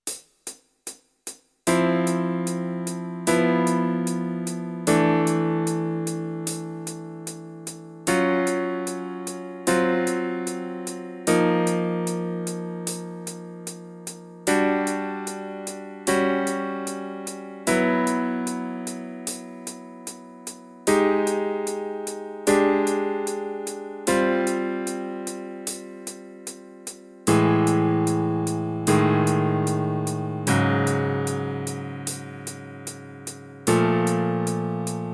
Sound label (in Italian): fisarmonica accordion, ii v i maggiore; Pillole di jazz… I Voicings – Lezione n. 5